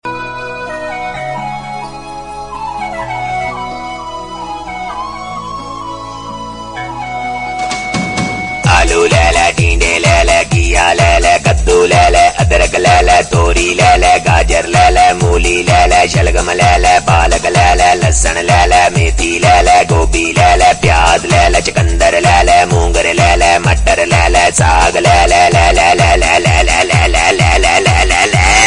Funny Alarm Ringtone

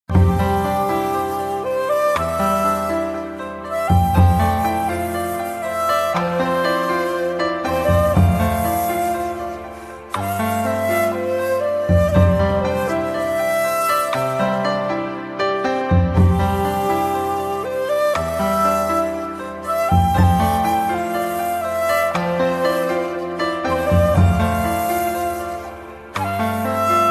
New Android Ringtone; ringtones

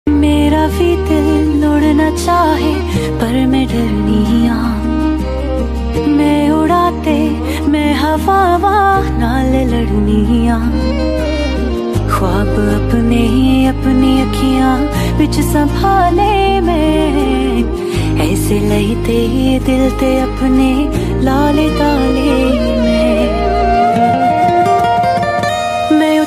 Romantic Female Song Ringtone

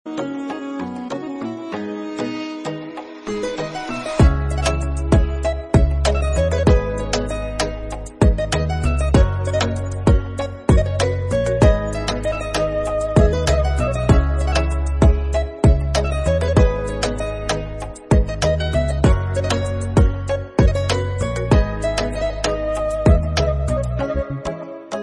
Instrumental

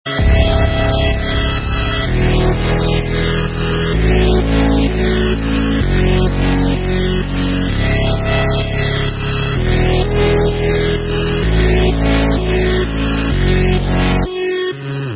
Running Music Ringtone; ringtones

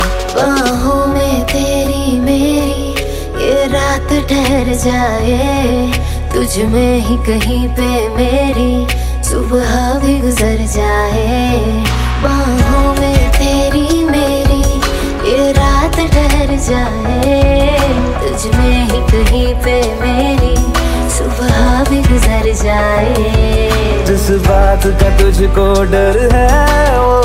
Most Romantic Female Ringtone

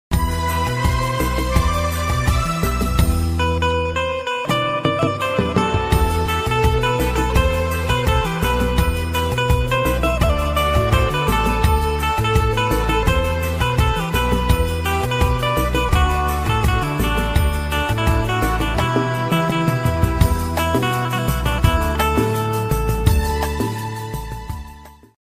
Love Instrumental Piano Ringtone; ringtones

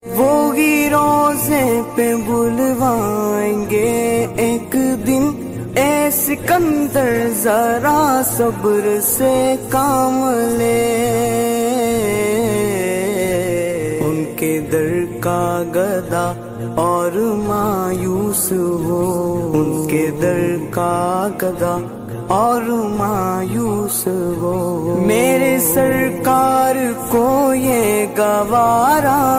Best Naat Ringtone

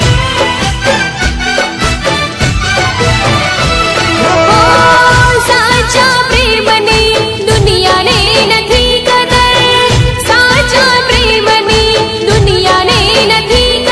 Gujarati; Heart Touching Ringtone

